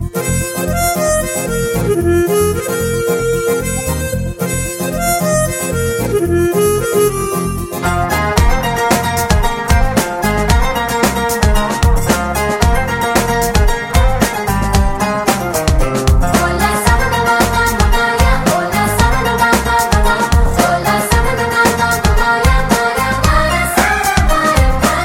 Instrumental Tone Ringtone

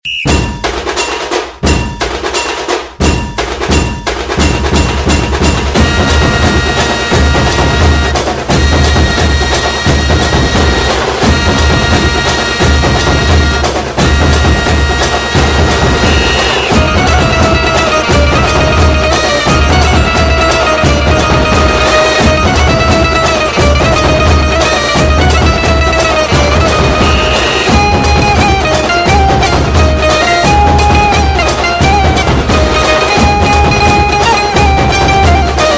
Instrumental Marathi Ringtone

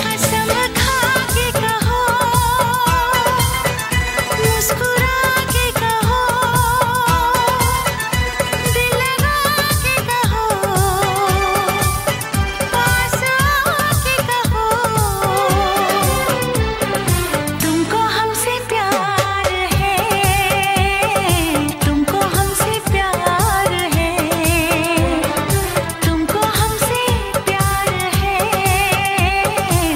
Song Ringtone

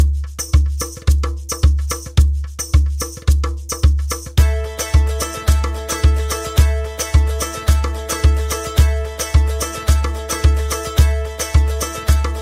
Music Tone; Ertugrul

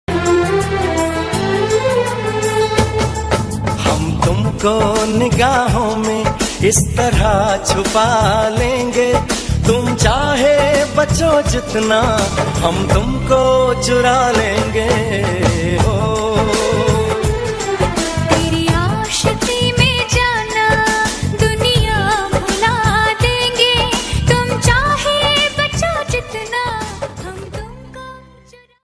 Tone

